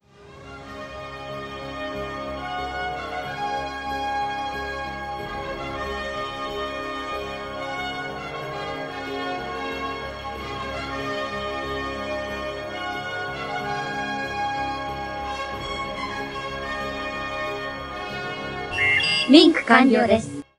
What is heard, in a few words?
Startup snd